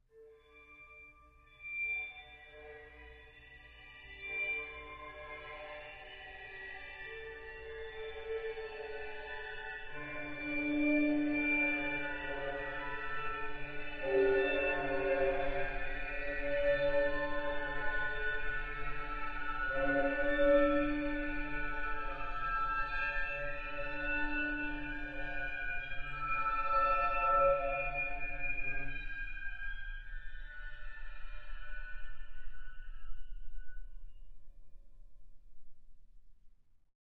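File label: Violin Crnchy conv squeaks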